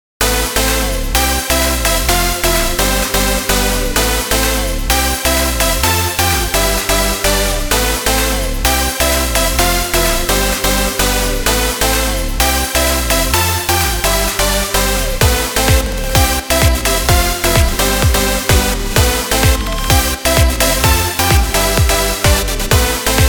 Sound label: edm